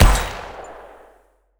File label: Gunshot Beefy; trap; FX; Cymatics; Trap Starter Pack